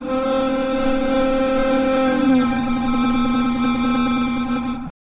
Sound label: detuned sound